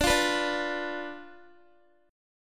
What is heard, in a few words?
D Minor 6th Guitar; Dm6 strummed